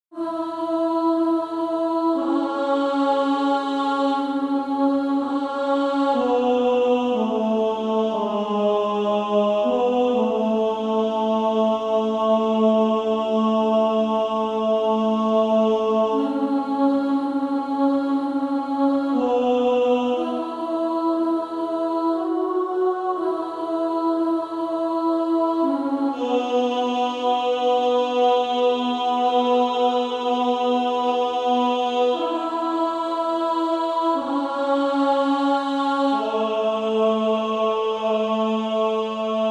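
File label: Female Choir, alto 1; Were You There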